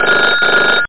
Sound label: PHONE; PSION CD 2